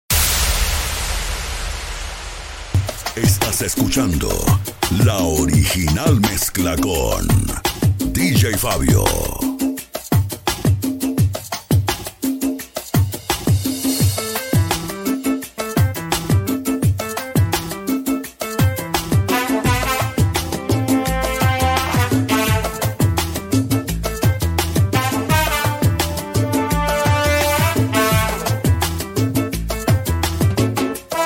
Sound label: SALSA MIX